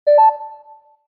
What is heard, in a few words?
Alarm Time Out Warning; Samsung SGH-E760